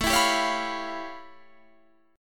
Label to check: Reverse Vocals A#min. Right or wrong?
Wrong